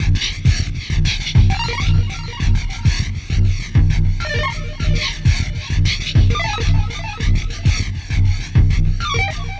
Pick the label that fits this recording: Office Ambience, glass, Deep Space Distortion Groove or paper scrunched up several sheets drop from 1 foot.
Deep Space Distortion Groove